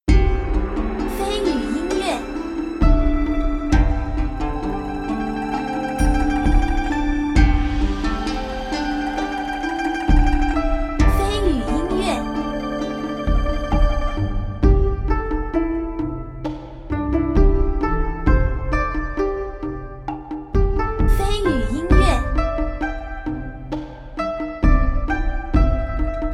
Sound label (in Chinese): 舒缓 优美 柔情